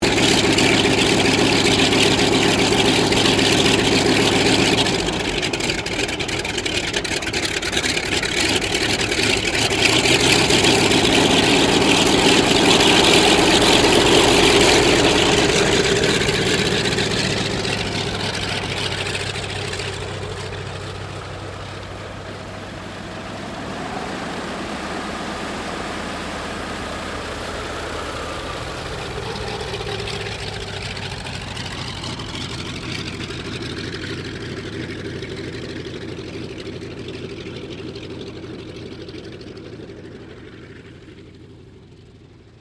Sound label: AIRCRAFT CA-13 BOOMERANG TAXI STEREO; Airplanes (Sound Effects:Vehicles)